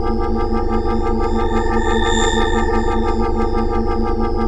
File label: UFO SUCK, disasters